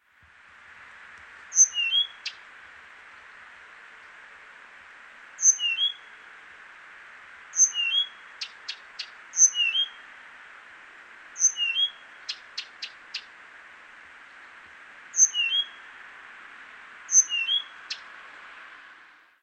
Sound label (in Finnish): viitakerttunen riihimaki; laurinmaki